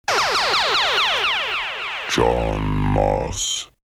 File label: signation jingle